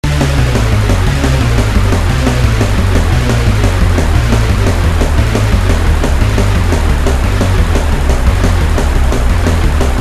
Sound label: Continue Countdown Theme; Bionic Chainsaw Pogo Gorilla